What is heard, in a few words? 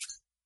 goal; free rider hd